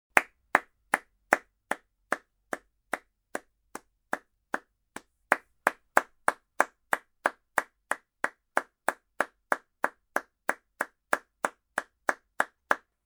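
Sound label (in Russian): clapping hands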